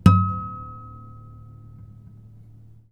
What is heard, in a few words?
harmonic, strings harmonics; 2 harmonic